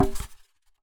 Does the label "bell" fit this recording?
No